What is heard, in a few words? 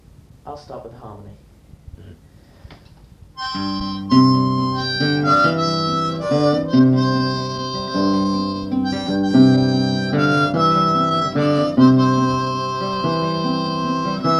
mouthorgan guitar one man